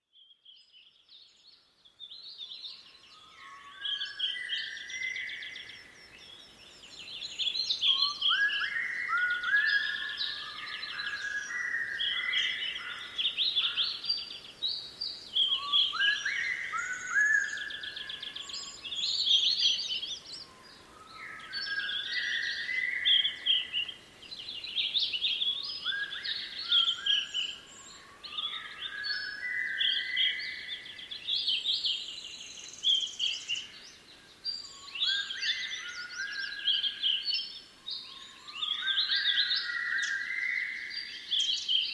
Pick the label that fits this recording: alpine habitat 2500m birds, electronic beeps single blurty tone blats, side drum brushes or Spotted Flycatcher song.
alpine habitat 2500m birds